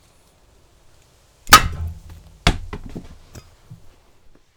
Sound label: Thack whump Chopping Block